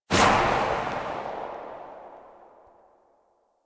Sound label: Pistol Tail; Warframe; Tenno; Priest; Weapons